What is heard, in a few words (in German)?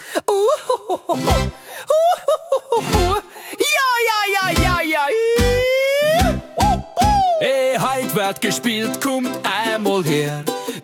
song Duett Frau und Mann